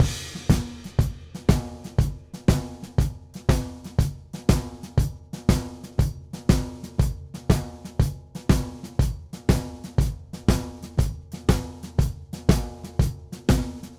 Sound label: Blues Drums Ending; Digidesign; Pro Tools Essential Loops